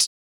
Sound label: Closed Hats